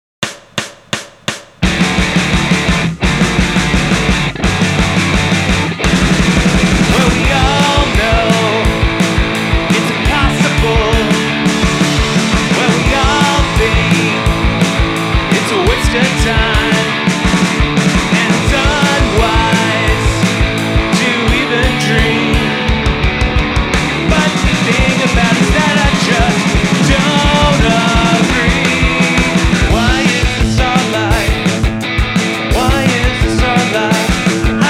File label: 171bpm track